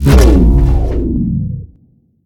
Impulse Gun; we are the dwarves gamerip 2016

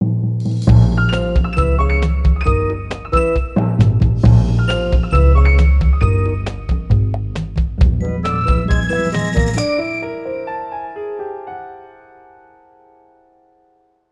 Bari Sax

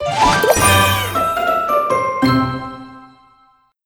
Crunchy Finish

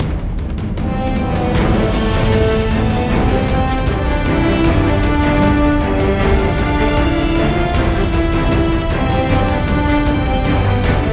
COMBAT THEME

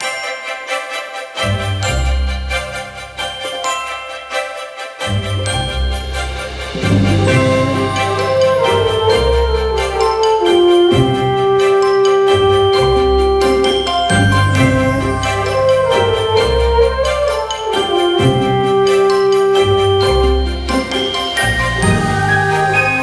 Victory Theme; Cat Quest II

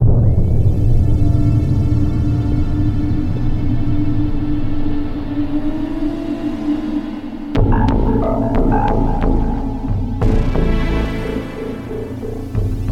chrome atmo

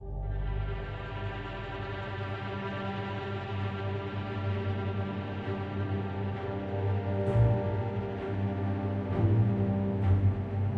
Music; Rothjack FP

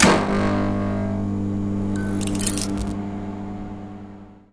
Power Went On; Welcome to the Game II

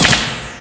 SFX Item Super Horn; Mario Kart 8

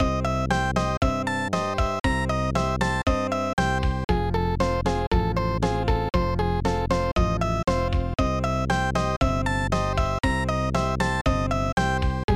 Round Music; pipe dream snes

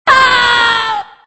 Fem Agony; Extra Lives

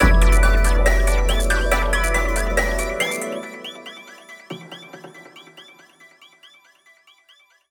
lose stinger; Disco biscuits

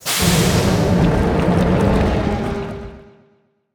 Umekigoe; Yu-Gi-Oh! Master Duel

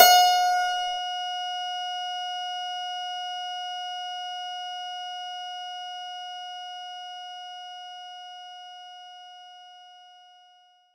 Dulcimer C5; knights try

